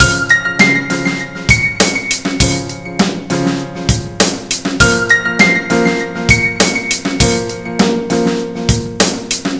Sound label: Fatty Loop